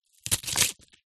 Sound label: leek slice knife on wooden chopping board